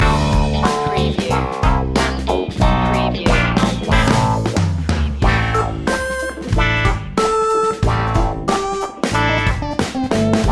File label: funky punky